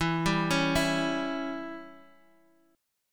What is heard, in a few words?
E Minor 6th